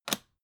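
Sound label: aluminium case small close short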